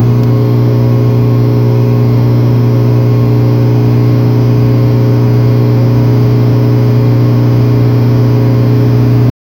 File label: ballast humming